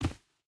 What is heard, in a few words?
footstep burlap dirt jump land; Rust